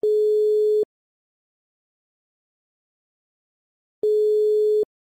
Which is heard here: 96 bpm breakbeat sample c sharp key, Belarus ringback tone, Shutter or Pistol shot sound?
Belarus ringback tone